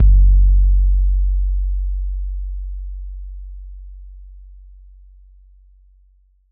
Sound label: 808 bass raw; Slate 808 Deep Dive; SD Raw 808s